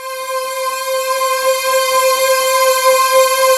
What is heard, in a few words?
GLASS VOICES, ROLAND D-50; Rubber Chicken Software Sample Bank